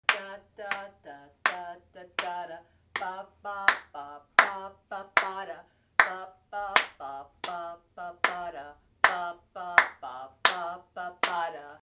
bass funky rhythm, marimba; kare mugomba

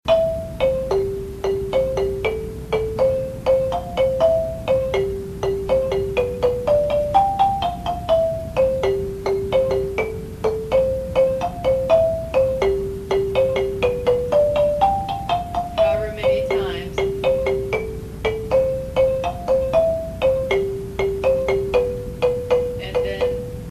lead trapped, marimba

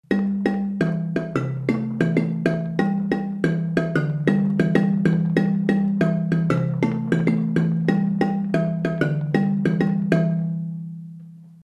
baritone high line, marimba

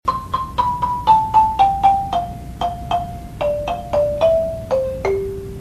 lead main var, marimba

